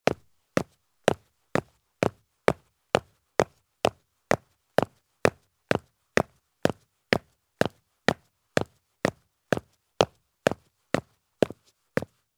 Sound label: Heavy Work Boots Take Fast Steps on Concrete Stairs; Sound Ideas; Footstep Sound Effects